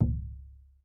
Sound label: PUEBLO DR, American Natives